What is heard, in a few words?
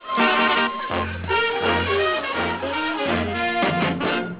bump music; bradybunch